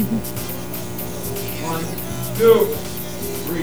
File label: old vocals